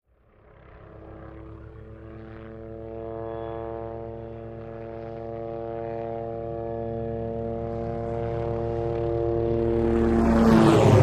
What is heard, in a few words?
Oh-6 Loach: In, By And Away. Stereo, little bird